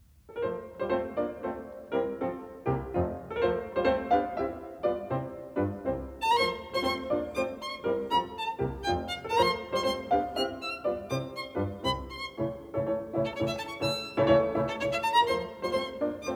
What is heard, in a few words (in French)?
Sonates Pour Violon & Piano, Sonate N°5 En Fa Majeur; Beethoven; Op.24 ''Le Printemps''; III Scherzo